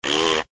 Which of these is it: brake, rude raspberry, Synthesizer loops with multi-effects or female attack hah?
rude raspberry